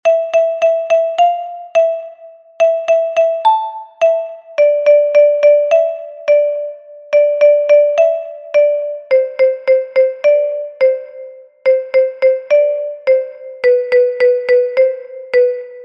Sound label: kalimba